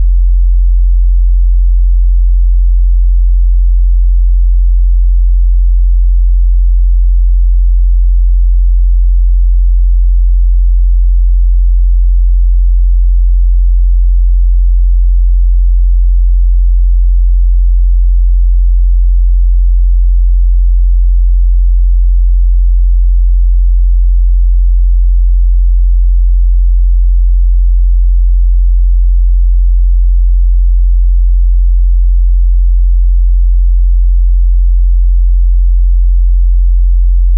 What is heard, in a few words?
sine, 44